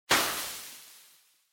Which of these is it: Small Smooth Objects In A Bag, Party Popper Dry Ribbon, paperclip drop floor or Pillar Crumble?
Party Popper Dry Ribbon